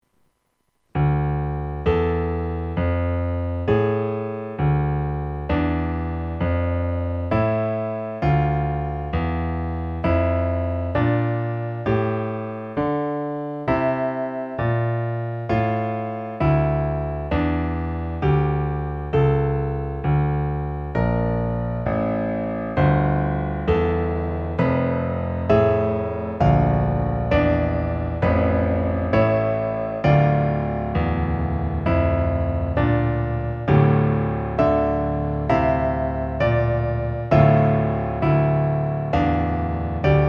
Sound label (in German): Klavierstueck